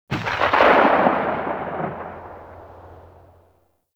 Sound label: Heavy Shotgun Tail A; Warframe; Tenno